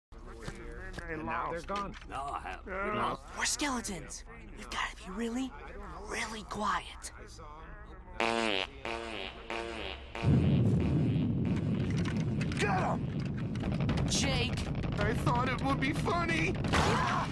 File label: reverb fart